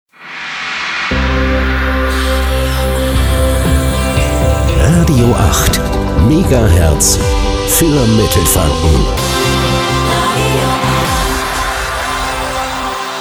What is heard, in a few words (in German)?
Transition Pop aktuell to Soft aktuell; Radio 8